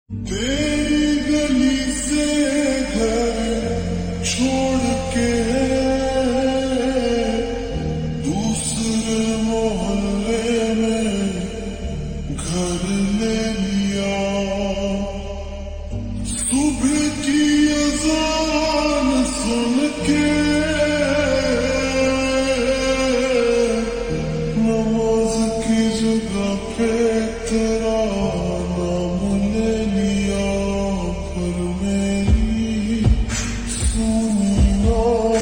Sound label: Ringtone Slowed Reverb